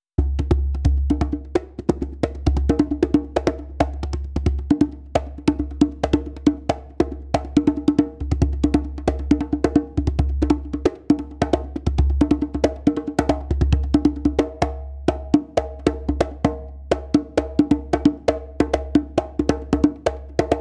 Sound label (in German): Trommelintro